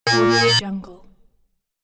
machine thin creak; cinematic-designs

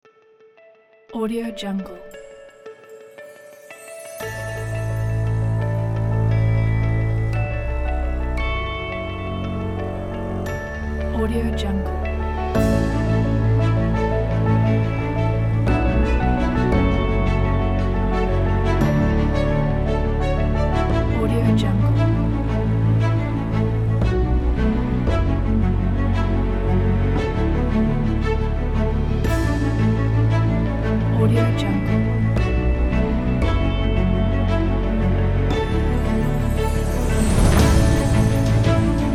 uplifting motivation kit

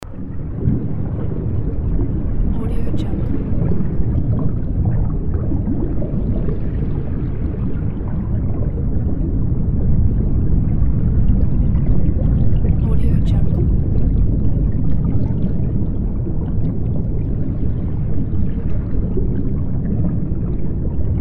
underwater

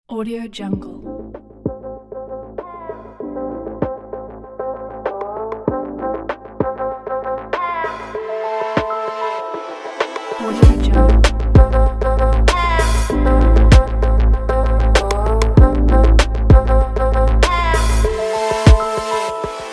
advertising modern hip hop trap

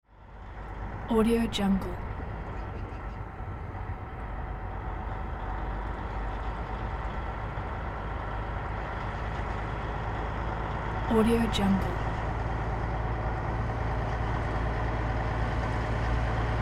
large dump truck passes by; hollywoodedge

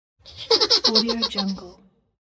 cartoon laugh

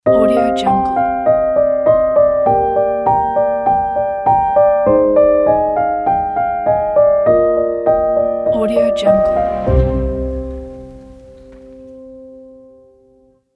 sad piano logo

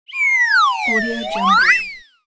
cartoon fly; kudlaproduction

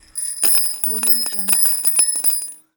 falling nails; electronplanet

